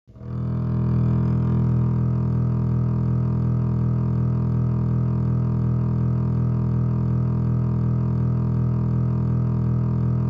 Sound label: bass E, tune